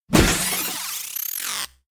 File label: battle wall critical; hideout